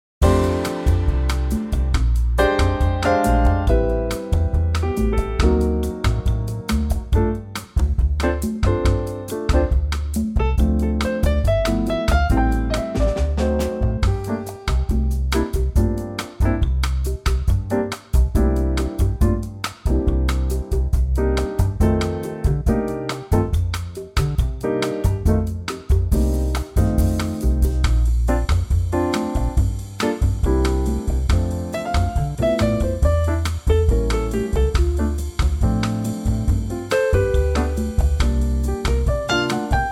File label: F Trio Latin; vocal range - F to G; Cool Trio plus percussion arrangement